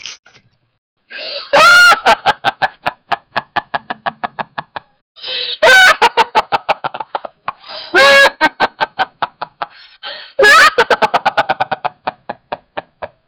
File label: laughing sound of horse; animal